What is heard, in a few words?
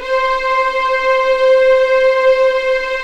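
C5 legato piano, violins; East West; The Ultimate String Collection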